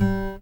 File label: ACSSFTFNGR, Guitar; 90 s Sample CDs; Quantum Leap - Guitar & Bass